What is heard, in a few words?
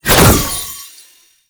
Summon Rune Spawn; Warframe; Uriel; Vythelas